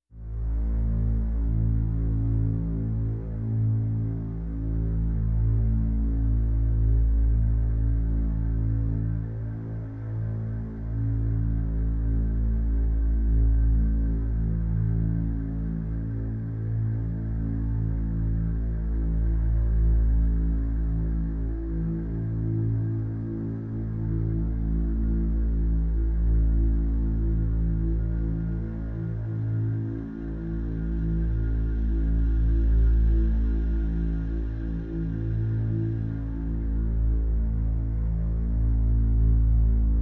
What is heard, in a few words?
A minor, No Guitar